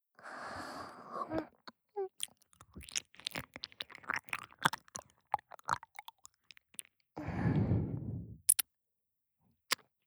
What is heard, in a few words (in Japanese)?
左耳舐めループ, ASMR